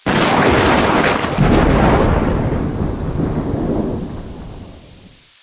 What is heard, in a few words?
lightning